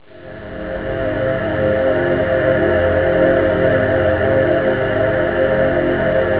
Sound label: ahhh, synth